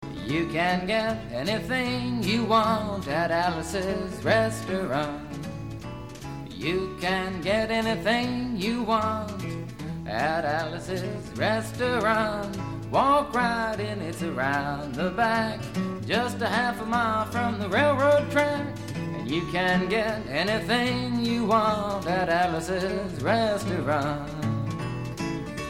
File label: ringtone